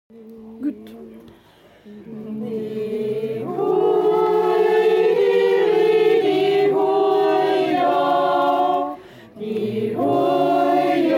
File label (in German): Jodler